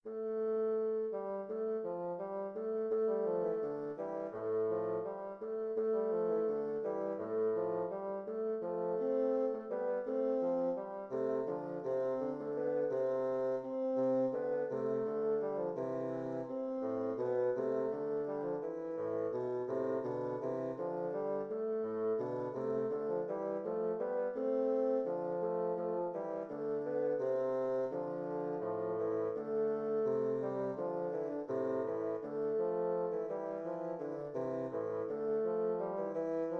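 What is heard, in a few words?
bassoon duet; josquin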